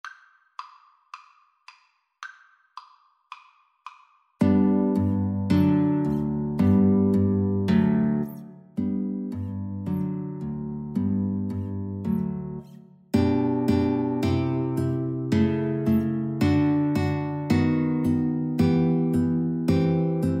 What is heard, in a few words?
Guitar Duet